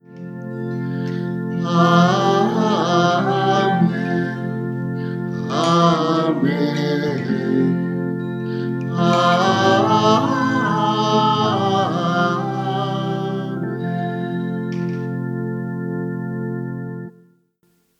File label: Amen